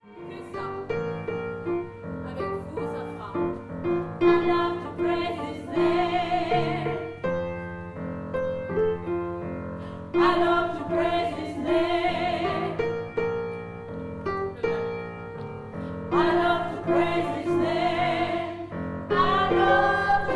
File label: mezzo